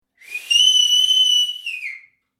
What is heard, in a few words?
Big Whistle, Mouth whistle being blown; Whistle Sound Effects; Lynne Music